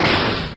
whip snap

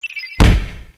mystery

